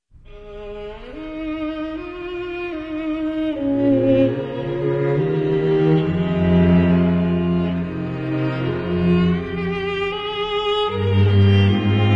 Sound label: String Quartet Carol; arr. Carlo Martelli